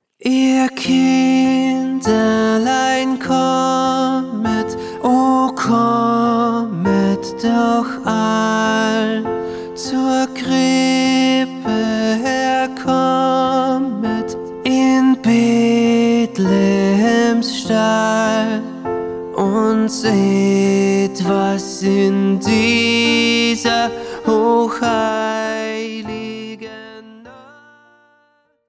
Ihr Kinderlein kommet, Pop Piano; Pop Piano Patterns

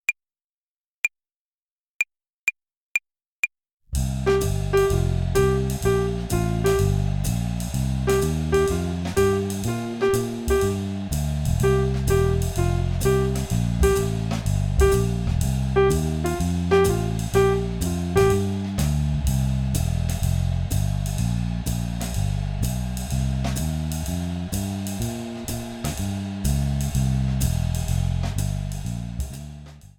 Swing-Phrasierung Übung, Play-along, Klavier; Jazz Club Klavier

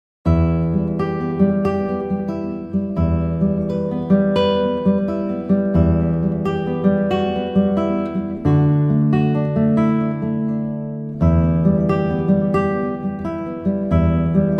Im Regenwald, guitar; Play Guitar Powersteps 1

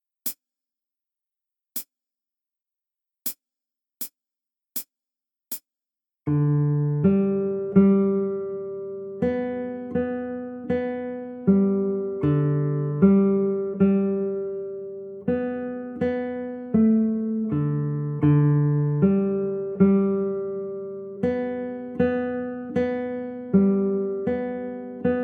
Tanzlied, guitar; Play Guitar Together 1; Trad. USA

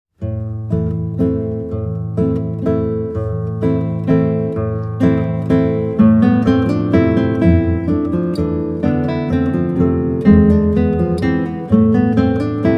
Abschiedswalzer

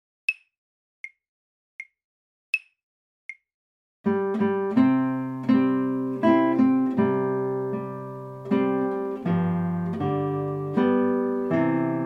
Die Gedanken sind frei, Gitarrenschule; Gitarrenschule Band 2

